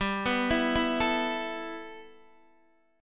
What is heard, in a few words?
G 6th Add 9th G, Guitar DADGAD